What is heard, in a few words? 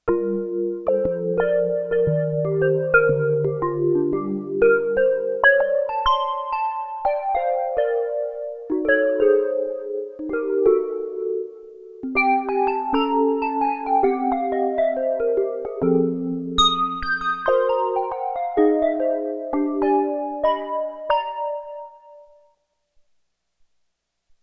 Vibes